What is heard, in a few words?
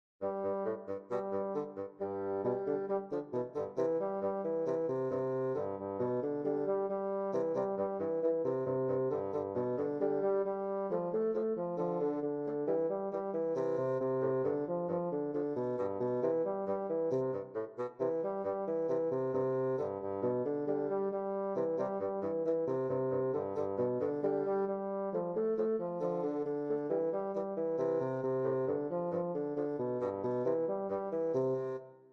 Chicken Polka